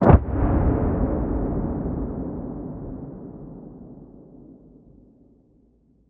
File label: combat atmosphere; World of Tanks